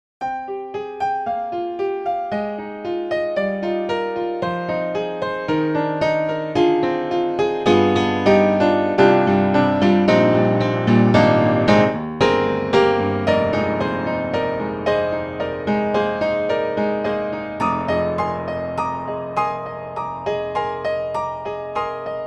Upright Pianos Modern Upright Tweak Modern Studio Upright; Synthogy; Ivory II